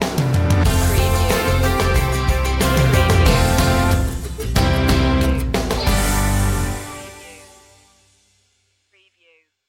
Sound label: open door sting